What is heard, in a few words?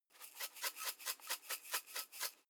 herbs shake dull sequence; soundpool